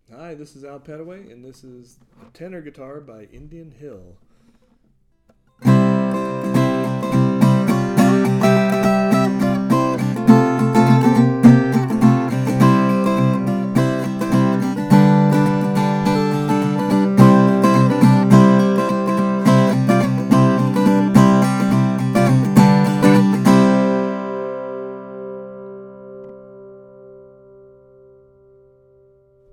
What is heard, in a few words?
tenor, steel string guitars flattop; 4 strings; indian hill